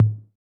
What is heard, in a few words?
Electro Kit Tom